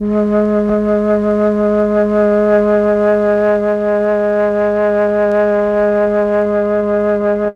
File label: flute; ILIO; Propeller Island Legendary M400